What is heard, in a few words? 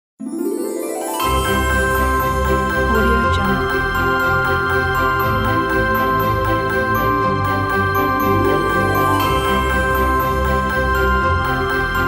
happy christmas ident